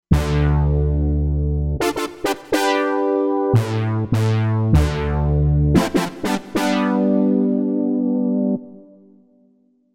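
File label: Brass Obersync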